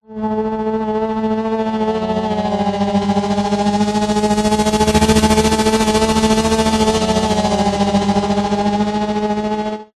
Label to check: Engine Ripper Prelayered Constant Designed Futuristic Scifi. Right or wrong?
Right